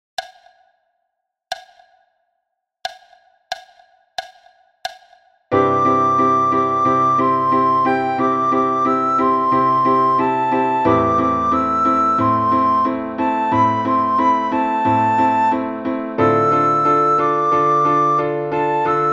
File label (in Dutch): sopraan; Flierefluiter 6